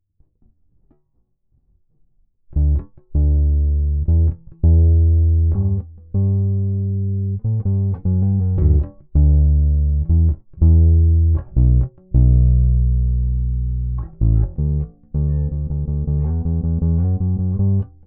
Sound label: bass chorus